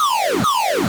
Bonus die; Blastmorph Pinball; xbox series xs

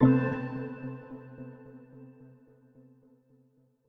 Jingles PRESS START; Semblance

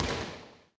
Ball Dribble Regular Court

